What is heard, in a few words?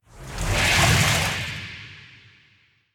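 whirlpool deactivate; smite; poseidon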